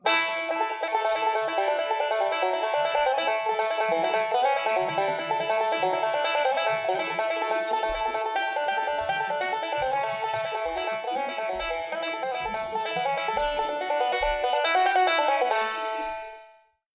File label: Kentucky Banjo; APM